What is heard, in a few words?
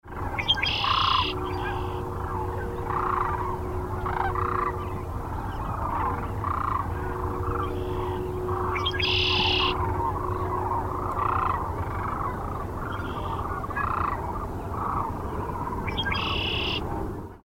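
Red-winged Blackbird; Kearney NE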